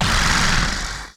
Zombie

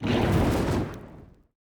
drakin shot

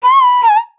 female hit

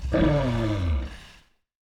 hurt; betsy

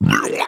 NPC Killed

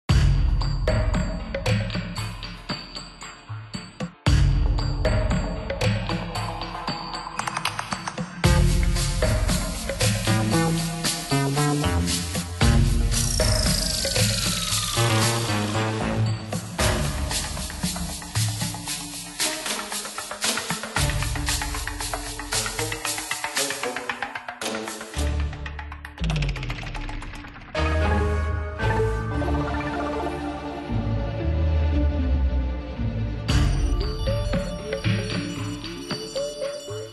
Music Surface Night Jungle

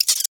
NPC Hit

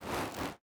swing; sky dragons fury

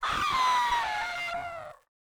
wyvern death

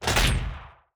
ballista tower shot

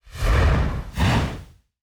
flying circle attack; betsy

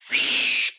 NPC Killed

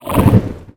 NPC Hit

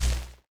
lightning aura zap

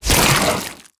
NPC Killed

